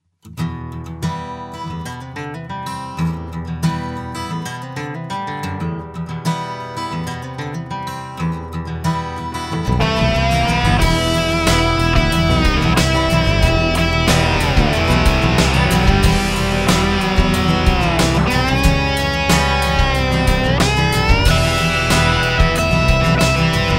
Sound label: Acoustic Rock Track